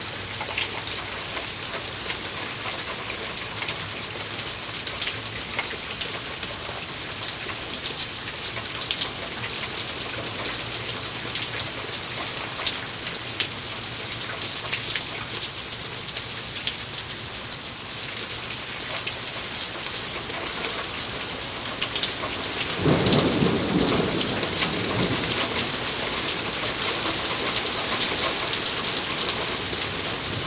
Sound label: rain and thunder